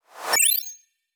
Riser Whooshes High Splash Fluctation

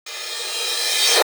Riser

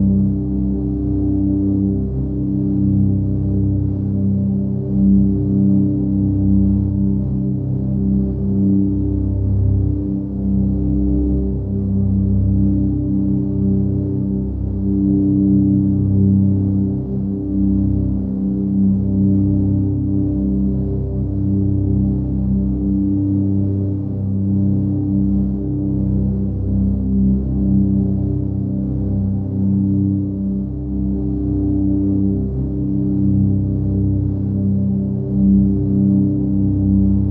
Drone

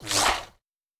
Fly By Fast Abrupt

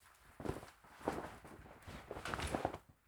Takes Off The Hanger, Clothes

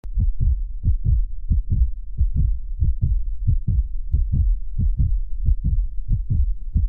Heart

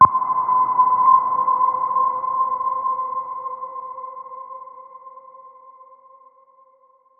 Cine Touch Muffled

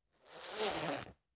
Zippler, Clothes

